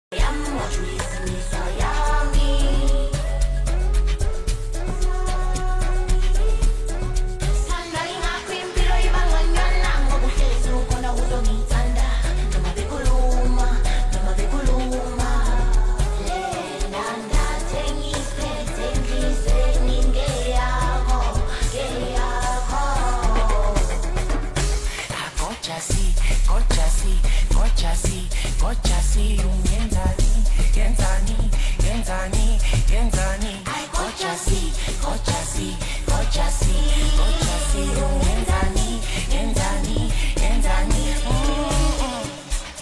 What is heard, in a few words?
Musical jazz Soul